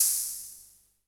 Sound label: SOLT CYM, Solton Disco-64